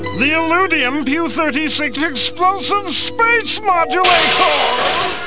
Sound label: modulator